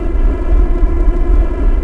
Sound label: fast, ships engine; Quantum Redshift